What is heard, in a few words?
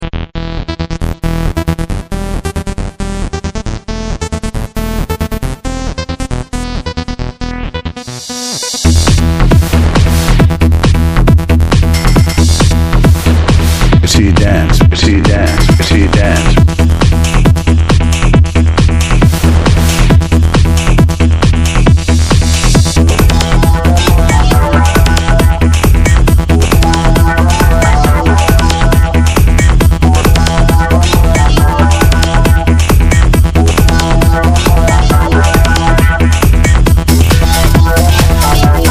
Music Dance, Tranceylvania